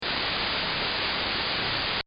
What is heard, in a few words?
static